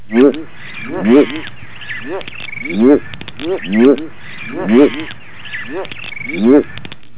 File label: african bull frog